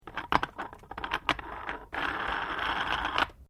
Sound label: Weights Dumbell Tighten Screw, Gym weight lifting equipment; Sports